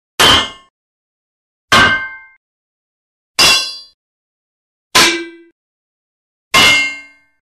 Metal Hits Metal Pipe Impact With Ring Out, Construc; Hollywood Edge Foley Sound Library